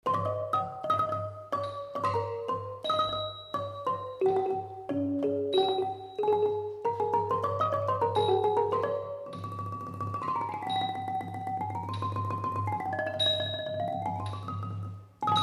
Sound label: Ranat ek solo